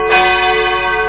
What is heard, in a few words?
Church Be